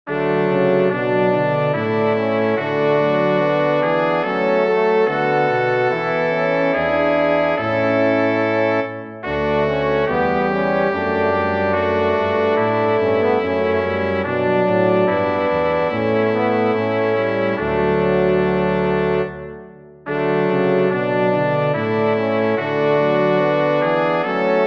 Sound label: chorale for brass quartet; bach johann sebastian; nun lieget alles unter dir